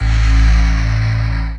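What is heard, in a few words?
Rave Synth, Dance Mega Jungle Rave; Best Service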